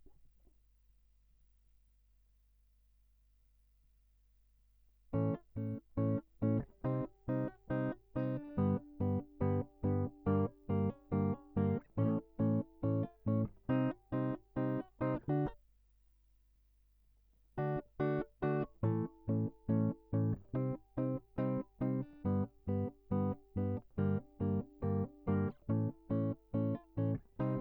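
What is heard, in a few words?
Guitar Line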